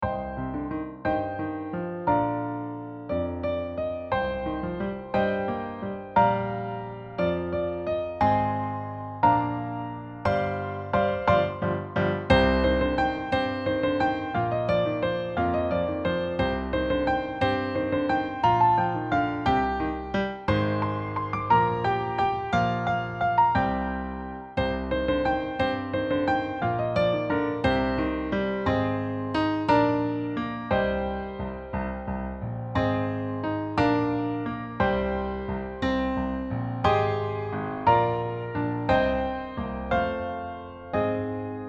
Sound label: Duet with repeat